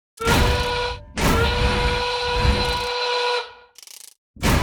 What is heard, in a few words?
Boiler offense Attack